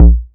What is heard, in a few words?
Hard Kick, TR-606 Bent Samples; Sonic Foundry (Sony Creative Software); The Electronic Music Manuscript Collection; Battery 3 Sample Kits